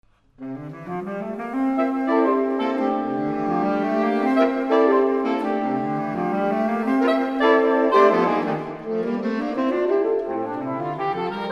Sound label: Jazz Suite